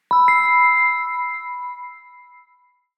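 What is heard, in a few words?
Dreamy Low Octave Casual Musical Bells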